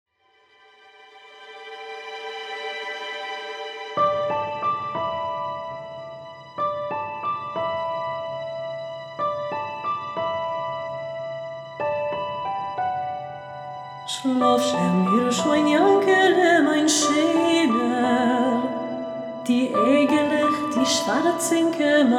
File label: yiddish; luftmentsh